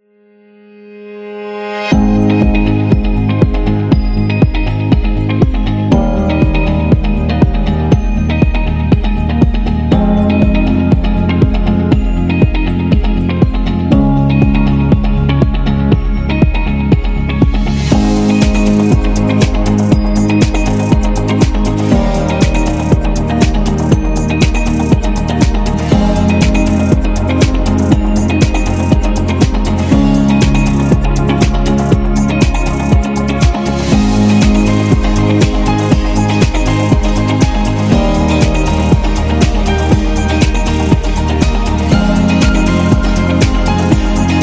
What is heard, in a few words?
Inspiring Beat